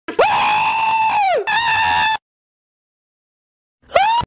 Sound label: screaming